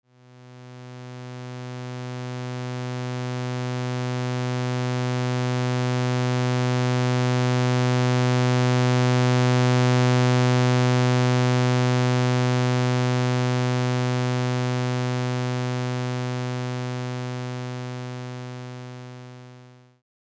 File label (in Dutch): Toon alleen harder zachter